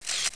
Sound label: sniper zoom; weapons